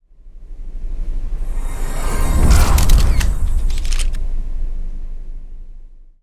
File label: mixed gacha